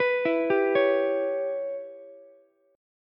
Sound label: C sharp Minor 7th Flat 5th, Ukulele; arpeggiated; 16,16,15,16; JGuitar